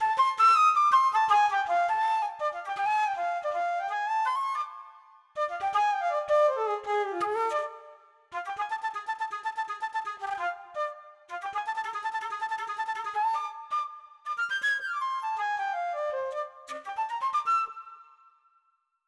Smooth Jazz; Scaramouche's Smooth Jazz; Samurai Jack: Battle Through Time

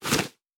Item Collect

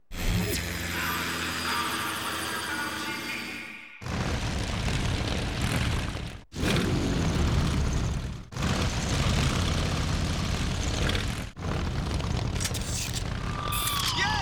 Bass; Dead or Alive 4; Xbox 360

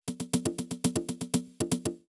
Perk Unlocked

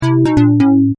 Incorrect; Kahoot

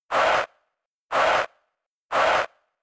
Uproar

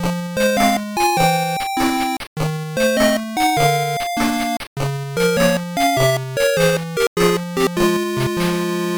Unused Jingle; Asterix and the Secret Mission; master system

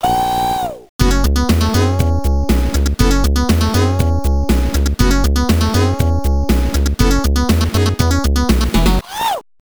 Dance Attack (with voice); Michael Jackson's Moonwalker Genesis soundtrack

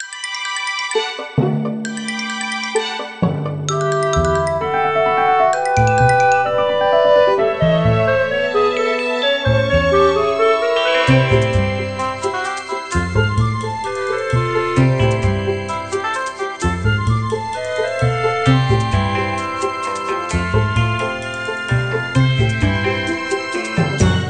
Game Music; Purr Pals Purrfection

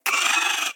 Spider Pain; The Inn

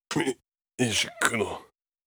in Pain

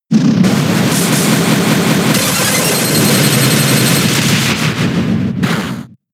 Hyper Beam; Pokemon; gen 6; XY; ORAS

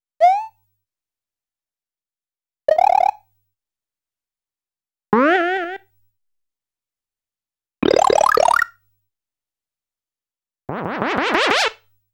Jump ~ Cool Cool Jump ~ Jump High on Platform; super mario world live; Big Mario Transforms into Fire Mario

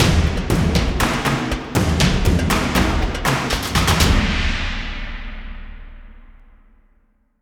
Ghost Forest Combat Outro; The Witcher 3 Wild Hunt

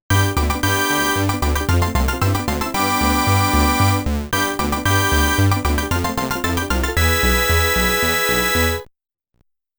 Ending Fanfare; The Tower of Druaga

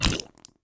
Monster Spit

